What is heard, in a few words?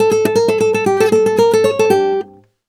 Flamenco, Guitar, a